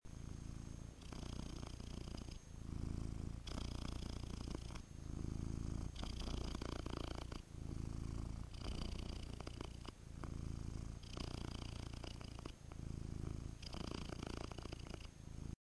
purring

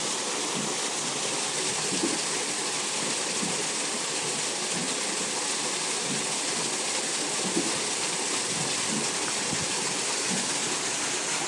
wool mill; solva

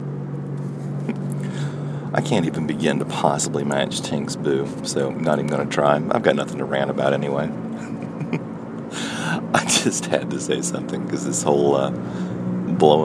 hahaha

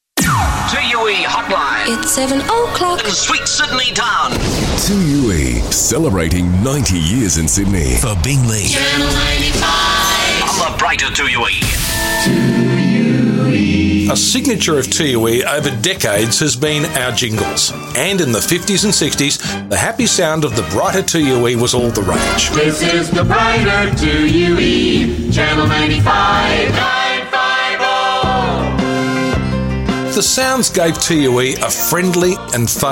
jingles

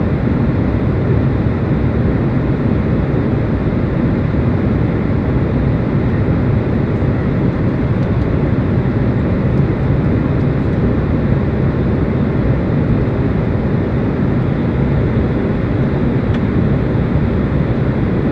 in a plane

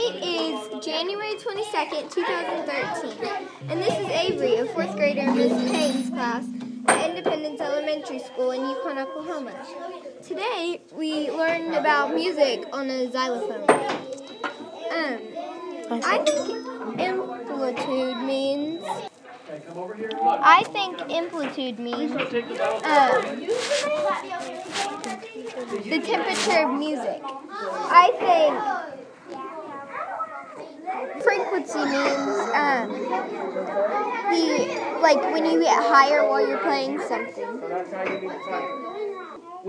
xylophone

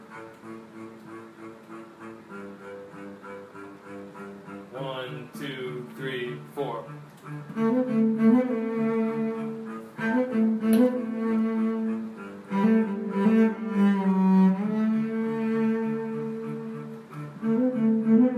cello looping

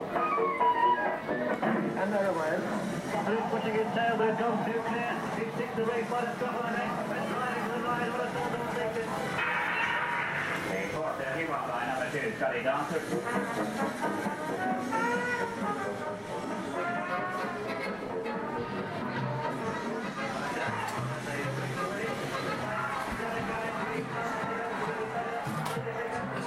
amusement arcade soundscape

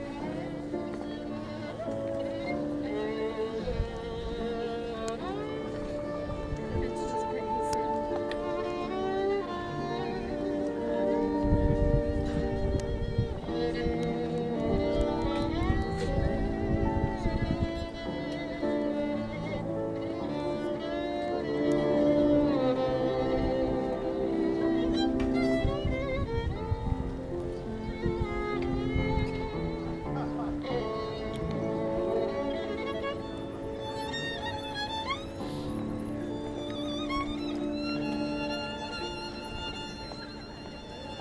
violinist outside the Pompidou centre

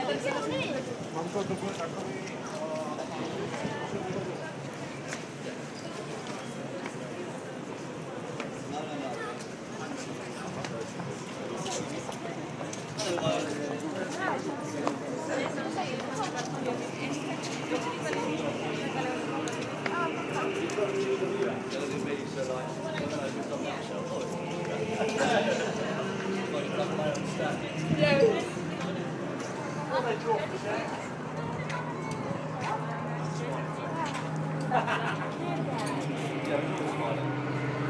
soundscape from the Southbank London

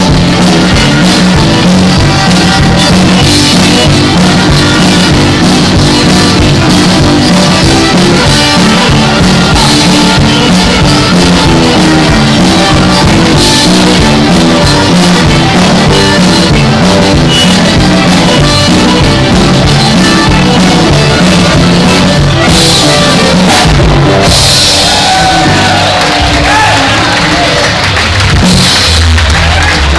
zydeco; at fete de marquette

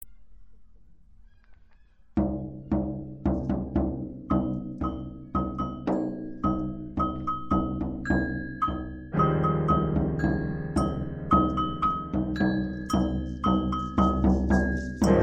storm music

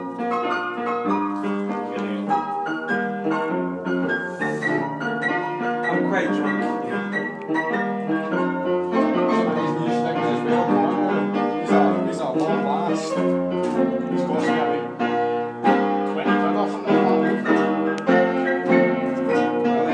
late night piano

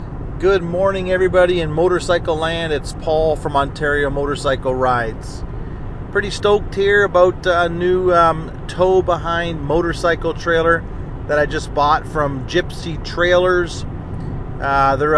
motorcycle; trailer

